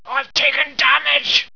trooper pain

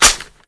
dart mag clap, weapons

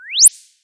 hud on; diekrypta

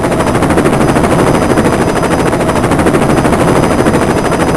helicopter rotor

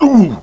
pain; caleb

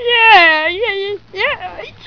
unwilling cheering

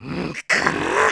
pain; fatbuu

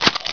rat bite

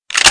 spas cock, weapons

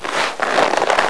jacket pickup

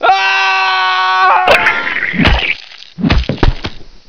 horror

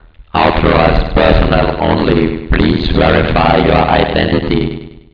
hall door

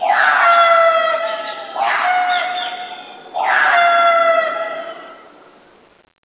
jungle call bird

